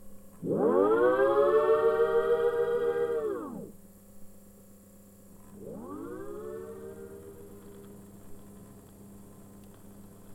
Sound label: Intro Cartoon